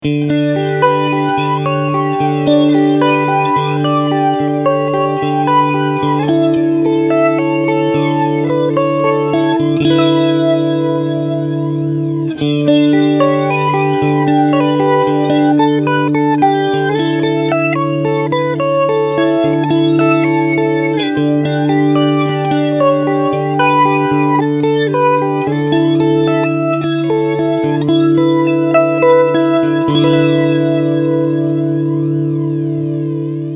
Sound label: Chorus Flanger